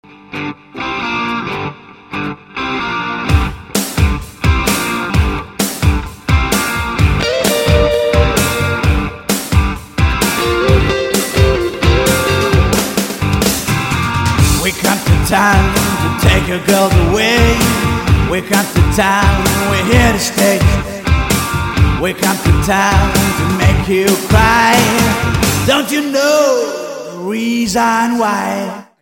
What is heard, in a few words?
Indian Rock Song